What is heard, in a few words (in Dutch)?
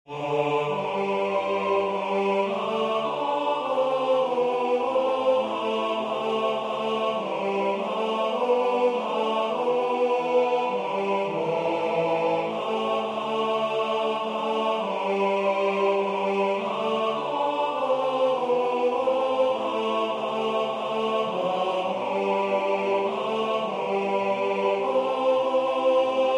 De dag door uwe gunst ontvangen T; scholefield